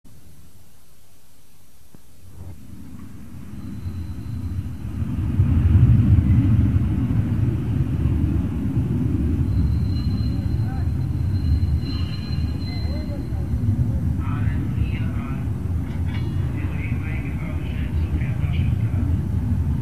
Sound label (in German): Aalen an Zug Rollen